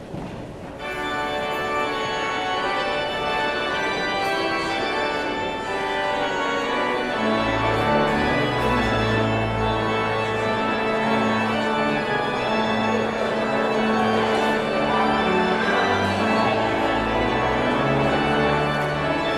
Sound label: Postlude